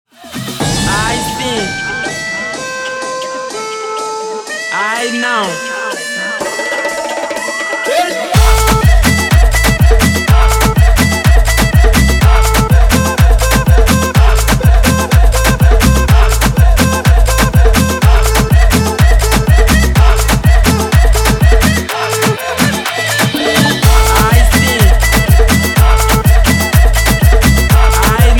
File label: luanda afro remix 124 bpm